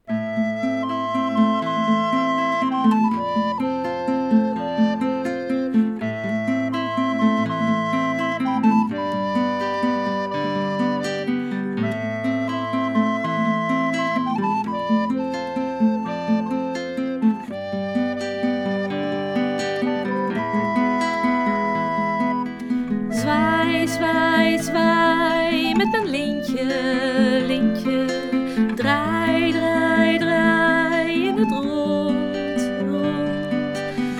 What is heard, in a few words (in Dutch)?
lintendans met zang